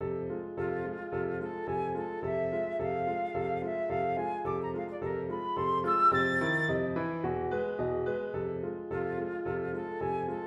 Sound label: Flute Sonatina